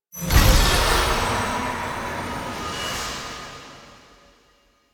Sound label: Horse Protection Ability; Vehicles; Duviri